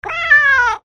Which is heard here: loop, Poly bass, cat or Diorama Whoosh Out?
cat